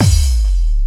BD, Dance Mega Drums, BD HOU; Best Service

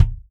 Real Drum Kit, ROCK KIT; Best Service ProSamples vol.43 - Real Drum Kits

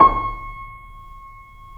C7 PP, The Ultimate Piano Collection; East West

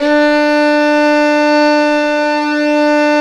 violin D2, Balkanish Traditions

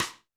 Snare, Roland TD-20

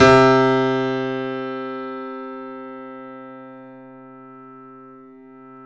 KEY, YC7; Roland L-CD701

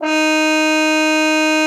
cup mute D#4; Xsample VOL-3

